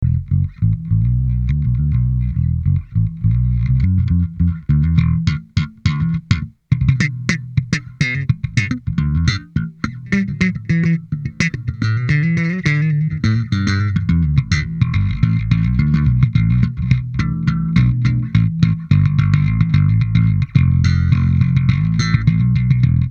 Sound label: Slap Bass